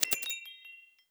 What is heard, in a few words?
quick Stop; netent